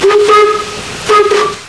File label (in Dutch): Cail fluiten